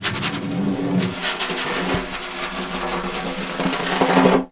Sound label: Snare Drum; Percussion Samples